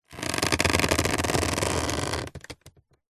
household basket picnic hamper lid open or close movement creak